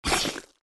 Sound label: Ink Spit